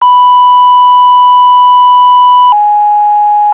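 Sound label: Tones, Fire Company; ELIZABETHTOWN 7-4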